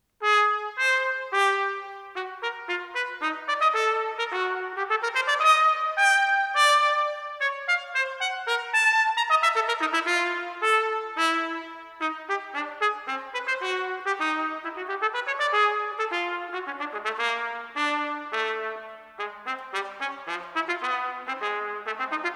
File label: etude, trumpet; Bitsch